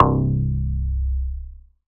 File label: Single Electric Basses, Dance Floor Bass